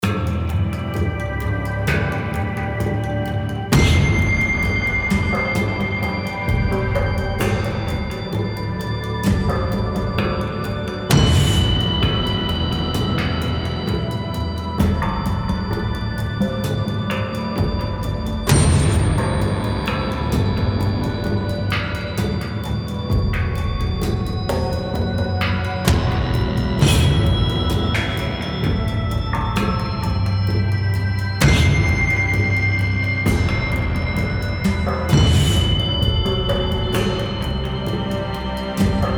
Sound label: Action (Loop); The Walking Dead: The Telltale Series